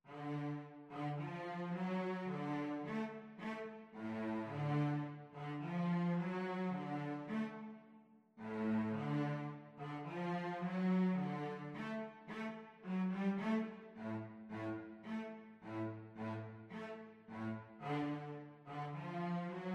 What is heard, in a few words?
Cellos Duet